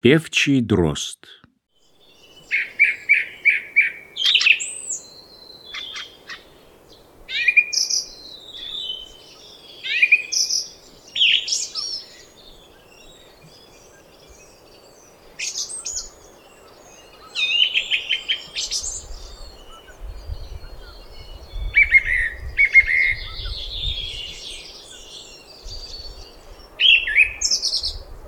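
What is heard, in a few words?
song thrush; Pevchii drozd; Golosa ptic i zverei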